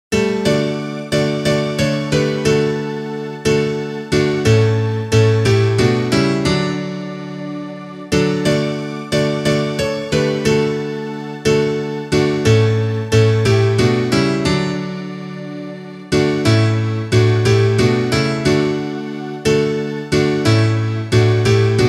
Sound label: instrumental